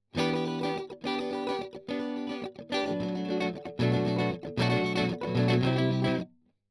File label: Strum